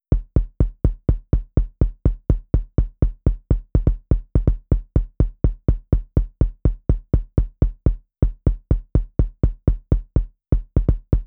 drums kick fast

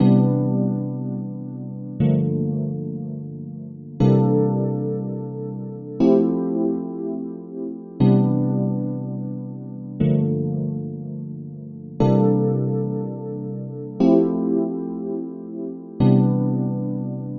Dream Rhodes

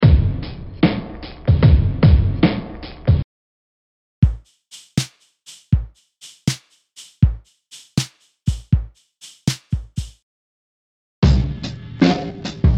Lofi; Lofi Sound Pack

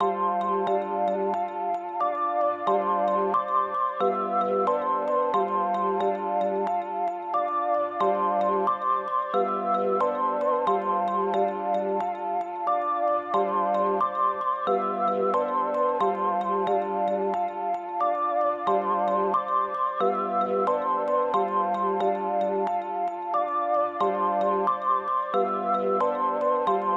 Gm90 Mellotron

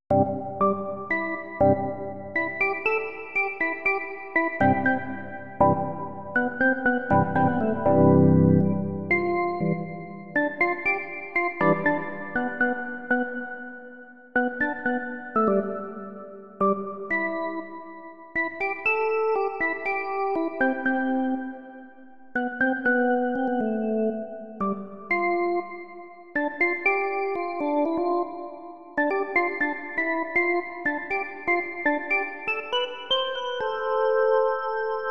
Organ

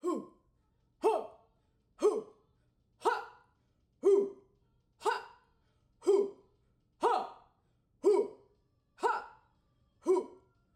Orc March Choir

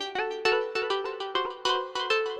synth Liquid Keys 100bpm G

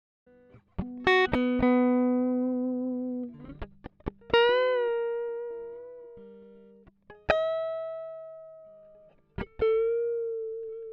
autumn sketch gtr dry

